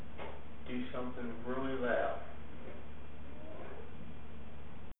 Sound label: Hmmm; jersey city